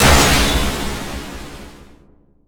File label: attack; tidehunter